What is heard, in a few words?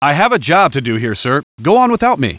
duck jump guy